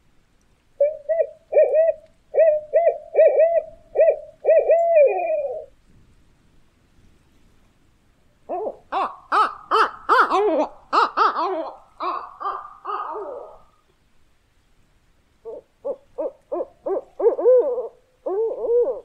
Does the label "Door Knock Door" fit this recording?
No